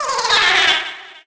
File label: Boo Cackle; Enemy; DS; NEWSMB